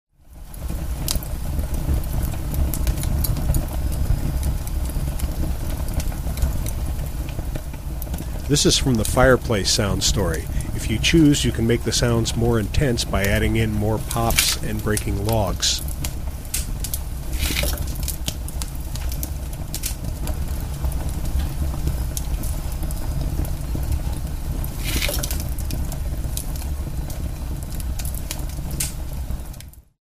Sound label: fireplace, Sound Sleep Machine; Ecotones Sound Sleep Machine ASM1002